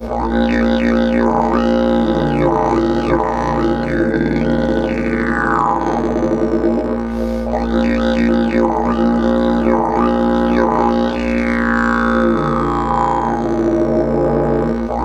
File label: LONG DRONE, Didgeridoo; Big Fish Audio - Didgeridoo and Other Primitive Instruments